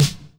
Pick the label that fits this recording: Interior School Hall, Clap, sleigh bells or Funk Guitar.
Clap